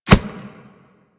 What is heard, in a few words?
Step Dirt, Footsteps; Warframe; Kubrow